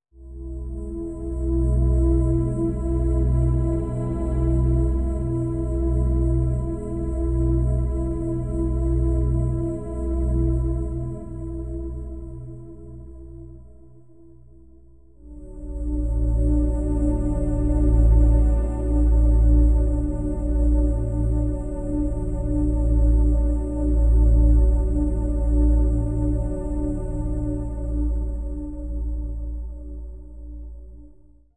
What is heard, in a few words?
Orchestral F